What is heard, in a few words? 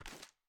ads down; gmod